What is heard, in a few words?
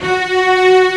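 String Sus f sharp 4; BGM; Nintendo; July 2020 Gigaleak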